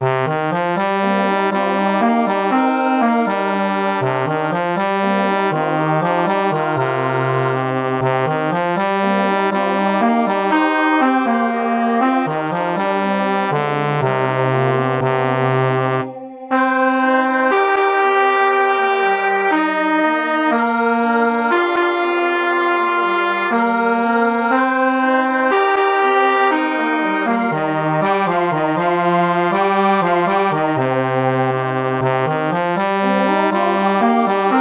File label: nobody knows tenor